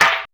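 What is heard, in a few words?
drum and bass snare; Drums In Your FACE!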